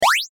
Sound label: jump